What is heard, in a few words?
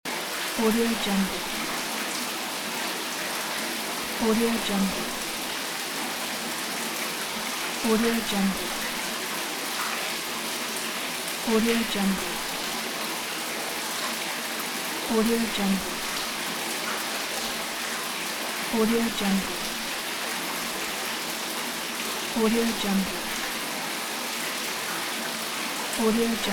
indoor fountain